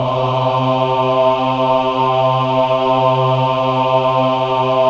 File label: CHO AH, Choir of Ahs; Roland - LA Composer vol. 1